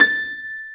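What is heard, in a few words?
pluck A6, piano; AKAI S-Series CD-ROM Sound Library VOL-2; PF MIX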